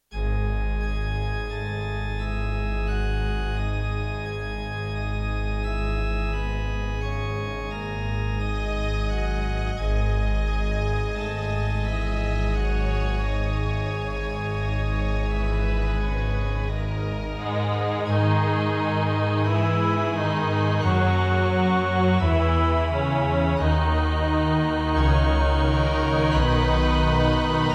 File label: MidiPlus MiniEngine USB; Azrael's Tear